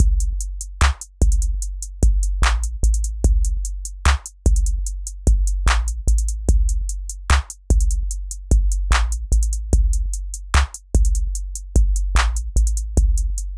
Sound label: Hip hop